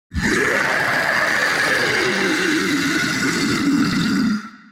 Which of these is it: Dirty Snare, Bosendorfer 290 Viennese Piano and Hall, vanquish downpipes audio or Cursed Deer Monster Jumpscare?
Cursed Deer Monster Jumpscare